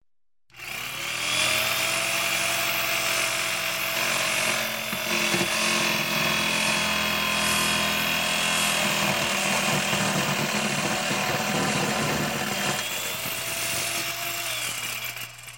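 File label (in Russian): звук электропилы пиление металла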